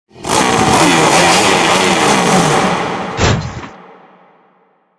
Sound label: revving up